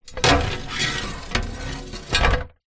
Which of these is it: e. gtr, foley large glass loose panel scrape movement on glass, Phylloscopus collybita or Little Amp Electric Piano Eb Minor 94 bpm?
foley large glass loose panel scrape movement on glass